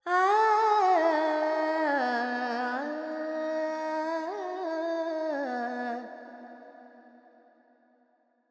FX Phrygian, Carnatic Female Alaps; Vocals; 70 G; Sample Banks

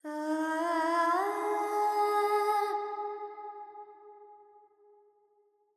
Verb Phrygian, Vocals, Carnatic Female, Alaps

